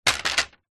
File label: wood stick cane thick drop ground; foley; zapsplat